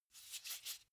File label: car flip key handling